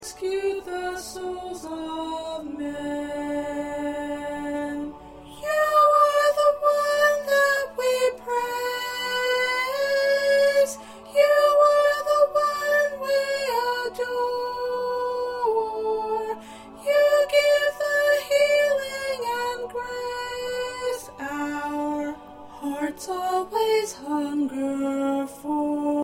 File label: 1st Soprano